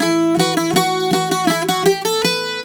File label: Celtic Flavours; USB Soundscan vol.42; AKAI